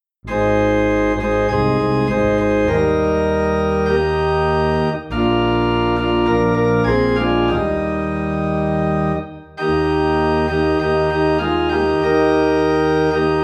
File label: Organ in E Flat; All for Jesus